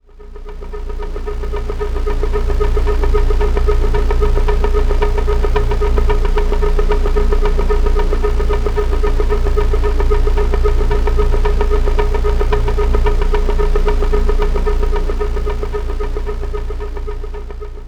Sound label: MoonDrone A#, Lunar Drones; Zero-G Seismic Frequencies